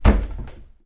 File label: household sideboard unit bash into wooden